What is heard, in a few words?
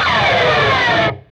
PRS SCRAP, Guitars; PRS SCRAPES